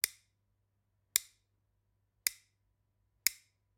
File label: Generic Click Wood Tiny